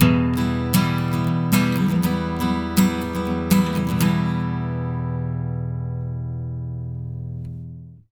120 MIN CHD E, Acoustic Guitar Loops; USB Soundscan vol.04; AKAI

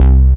BASS Green, bass; M8; Electronisounds

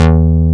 funky bass; East West; Global Sounds

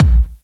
BD, Dance Mega Drums, BD HOU; Best Service

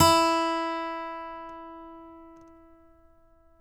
ACCGTR PICK, Country

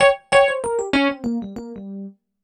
EP, Keyboards, Electric Pianos

